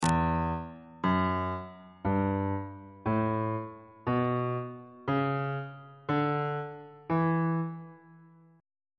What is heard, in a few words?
e dorian mode